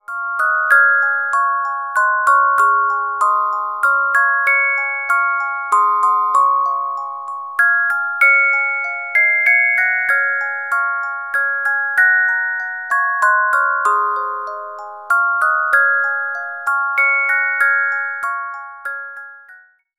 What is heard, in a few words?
Carillon Music Box Melodies